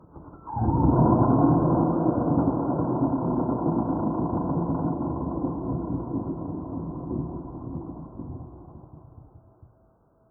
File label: noise